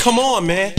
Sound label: COMMON, HOUSE; Crypton - DrumBase5000; HOUSE #1